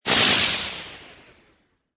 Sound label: Magical Cast Flutter, Magic sound of casting spell, conjuring or wizardry